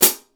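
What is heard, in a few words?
HI-HAT PEDAL; Greytsounds; HI-HATS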